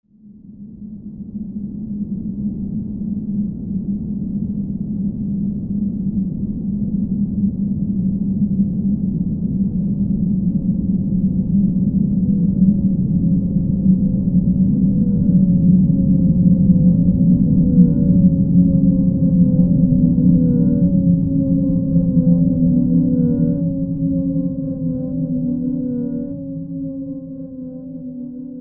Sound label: The Wastelands Dark Sinister Ambience; Scary Soundscape Sound Effects; horror sound effect